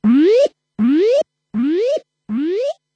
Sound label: Throw; Kairobotica